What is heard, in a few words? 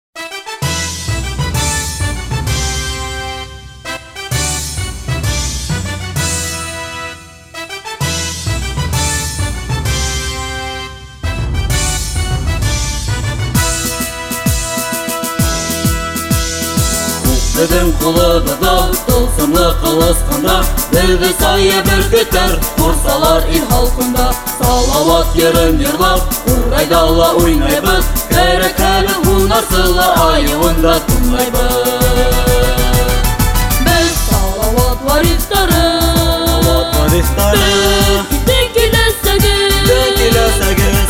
vokal ansamble